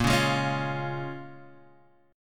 A sharp Minor 7th, A sharp, down, Guitar, Half Step Down